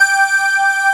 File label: SYN G5; Best Service Gigapack 1